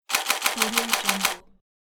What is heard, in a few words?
camera shutter burst mode